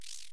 spider buddy jump

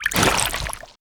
Enemy Bad; Etrian Odyssey II HD

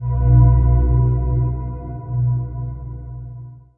sting box close

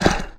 wolf noise

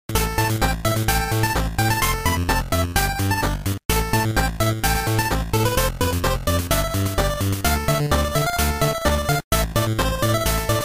Rocky Battle

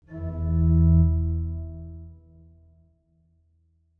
organ E1; Apocalipsis

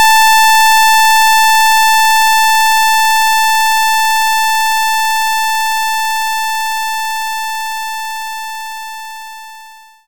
Sawtooth Wave A3

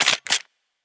Weapon Reload

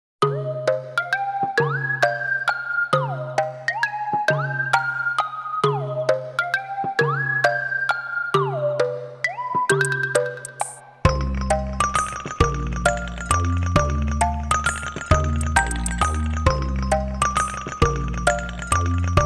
dry empty station; karakara station

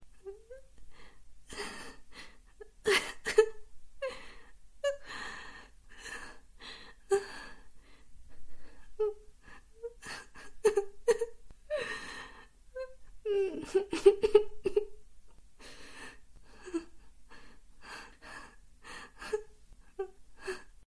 Cry; Hornycraft

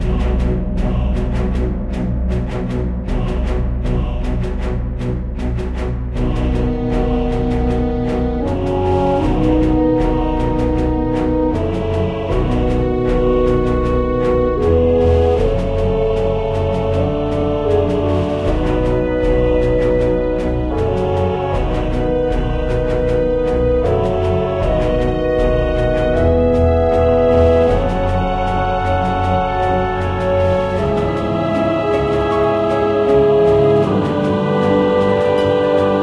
Build Up Gardens Ramparts Low, gamerip

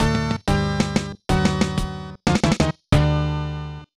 Lose; Street Combat; snes

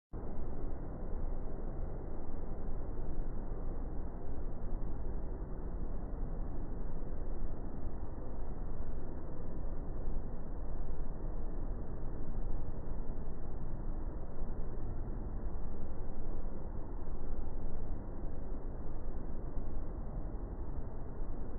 Main Ambience; Five Nights at Treasure Island Anniversary Edition

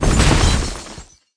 Ice

